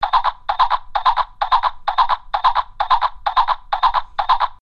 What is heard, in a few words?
Galloping Horse